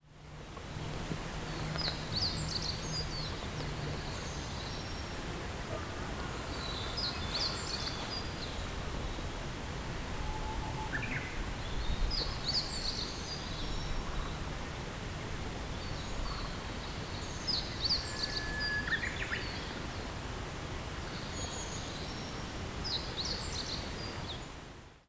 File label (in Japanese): hooziro, bird song